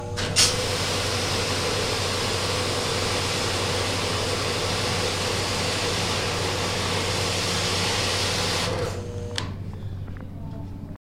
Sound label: Pressure Washer Bounce